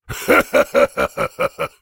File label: laugh; Drmundo